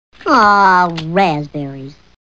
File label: raspberries